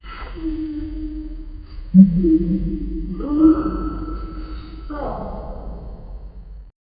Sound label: the sound of a falling